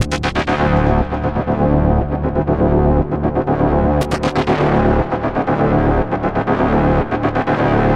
NRG Pad

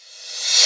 Reverse Cymbal; Best Service; House Definition VOL-1; Cymbal Stuff

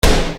Snare Dr; Best Service; Ethnic Journey Sound Cube; EuroDance Essentials; Snares

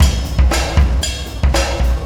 Beat, Processed Drumkits

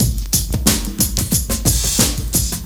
90 DRUMS

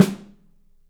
PICOLO; 3D Drums

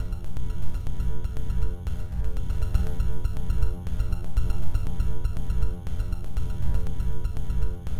Glitched, Processed Percussion

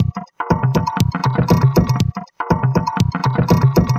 Muthi Drum Beat; Sonic Foundry (Sony Creative Software); Myths of Technology; Composite Beats

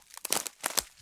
Crunchy Step, outdoor, Footsteps

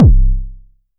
SH32 Kick, Roland Drumset

